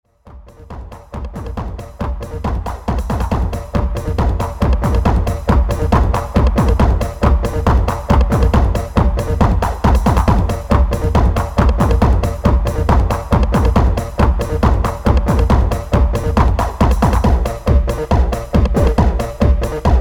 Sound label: Beatbox Tick Mix